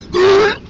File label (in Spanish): vomito